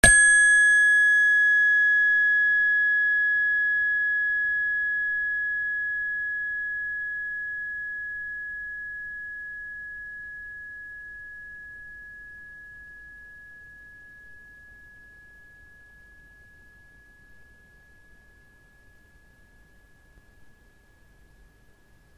space bar

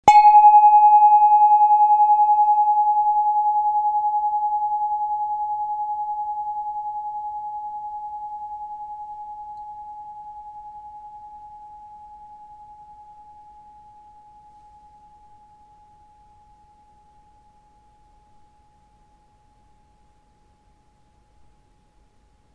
cosmic tube

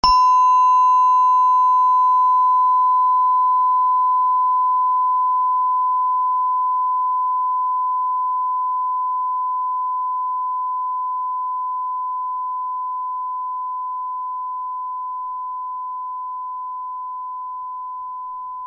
space bar sonne

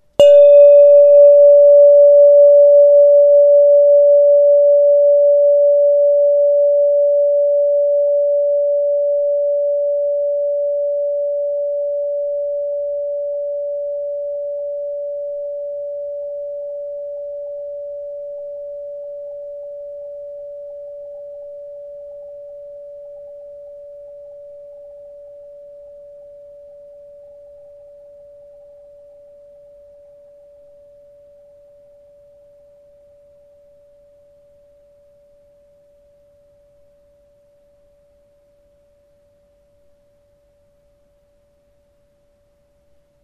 cosmic tube mars

